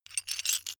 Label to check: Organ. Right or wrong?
Wrong